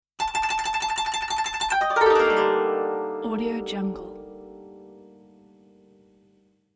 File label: japanese koto tremolo scale down